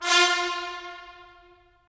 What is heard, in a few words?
BRS, Tps Stacc; Roland - LA Composer vol. 4